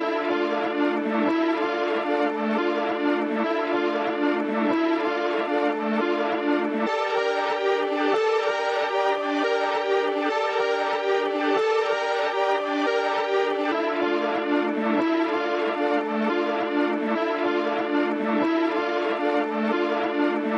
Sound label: 140 BPM D Min Main Melody, Drill; Loop Stems; Cymatics; 2022 Melody Collection; Smash